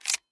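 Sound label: click of pistol trigger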